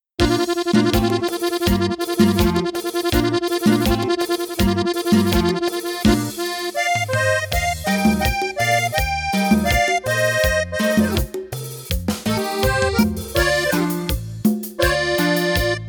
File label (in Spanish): Cumbia; Norteño